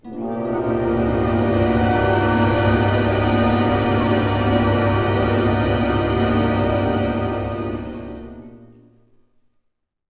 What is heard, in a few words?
telepathy; Star Wars Knights Of The Old Republic II; XBOX